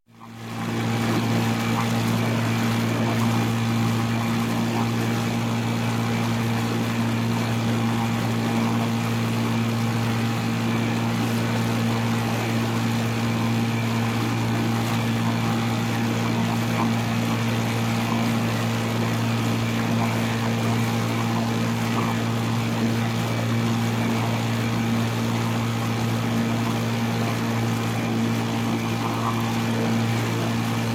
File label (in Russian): dvizhenie motornoj lodki